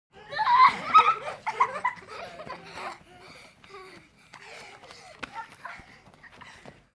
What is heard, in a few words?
kids playing and laughing